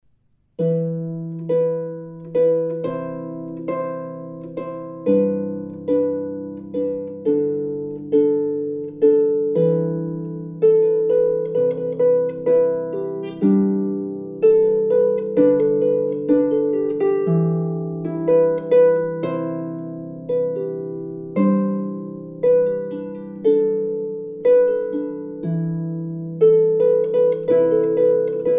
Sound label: contemporary pop example, fade out